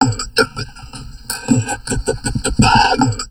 TALK, Drum Loops Crazy Processed

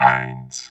VOX VOX, Electro Vox

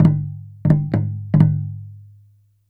Small Taiko, Asia; Sonic Foundry (Sony Creative Software); Continental Drift Aftershocks

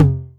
tom mid, Novation Drumstation

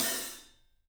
ZILDJIAN HT; 3D Sound; 3D Drums

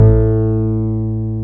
D10, Synth Bass; LA Syn Bass

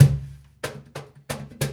Cajun, Percussion, Drums; Essential Percussion

